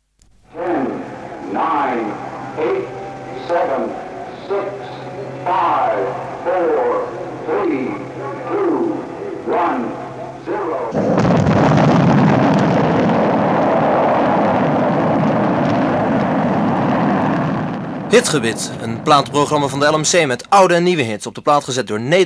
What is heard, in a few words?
tune; Hitgebit